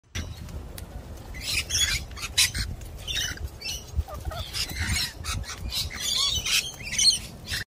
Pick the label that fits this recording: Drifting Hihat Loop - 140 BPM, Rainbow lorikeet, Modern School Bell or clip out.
Rainbow lorikeet